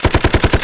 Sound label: tututu; fish; tower client